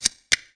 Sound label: Play Menu Close; Pangya; Fantasy Golf